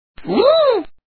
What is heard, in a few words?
woo